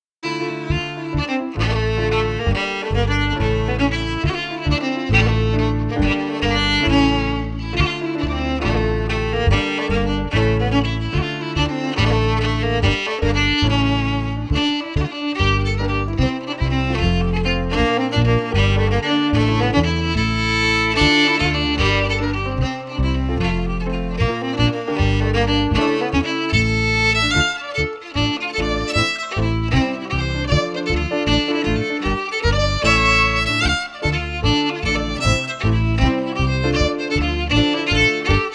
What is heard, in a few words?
Fiddle FIDDLE PIANO; Jink & Diddle Book o' Tunes 2022